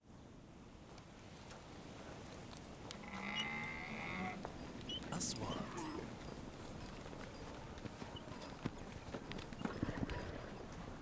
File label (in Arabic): صوت الإبل قافلة; sound effect; رمال